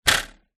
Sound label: cartoon twang dive spring board